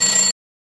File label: Doorbell Ring; door bells